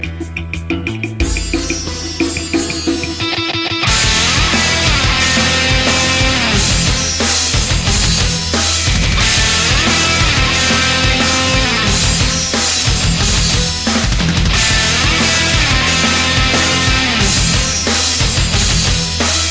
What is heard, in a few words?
musical ringtone